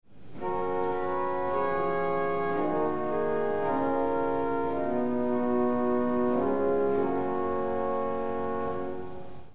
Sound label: sw sal